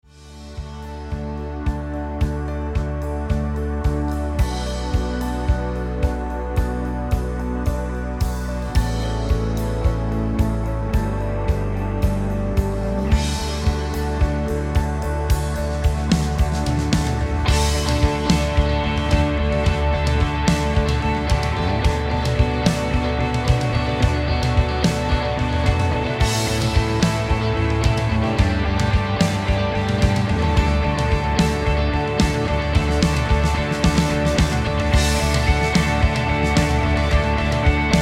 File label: rhythm